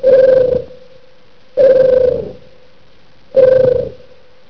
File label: tribble; Aliens; Star Trek TOS